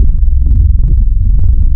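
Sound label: acoustic guitar; Adventure Kid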